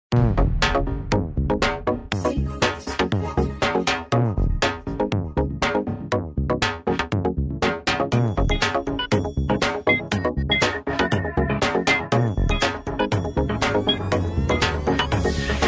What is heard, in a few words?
Telugu